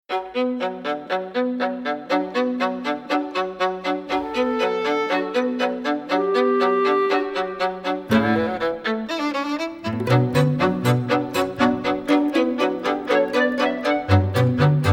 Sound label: Orchestral, Music